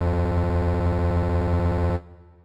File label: Starting Line; Cars Supercharged; Leapster